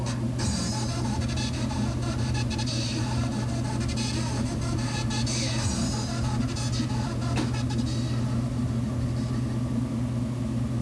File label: bass just boom; All sounds were taken from a 8mm video camera, and lined-in to the computer